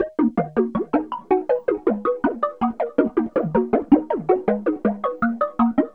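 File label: synth, Drum & Bass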